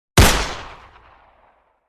9mm handgun single shot

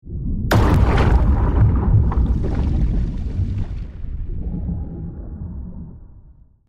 Naval underwater missile launch shot; ship

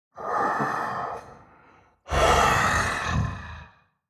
Demon king lord breathing

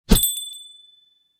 Cheerful swipe transition; shorts sound effects

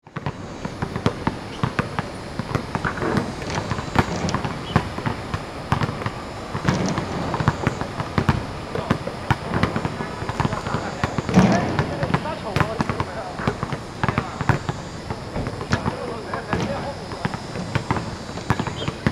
Playground sports players bouncing balls loop

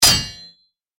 Sword clash impact metal clang

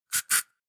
Spray deodorant

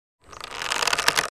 Archery bow draw

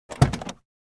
Car door open exterior

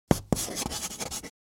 Chalk stick writing short

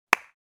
High five

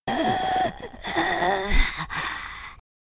Female zombie breathing fast

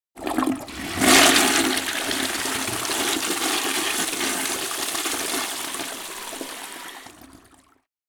Toilet flushing full sequence

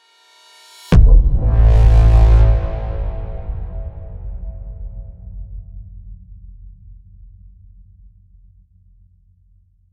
Cinematic braam hit impact; transition

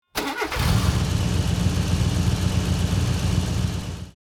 Car engine start up ignition starting up and idle

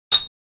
Empty glass beer bottles clinking together

